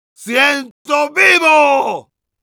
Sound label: positive vocalization